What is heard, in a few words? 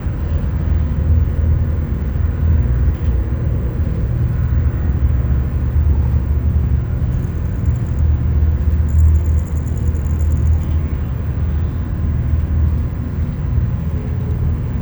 Drone Ambient; Drums & Drones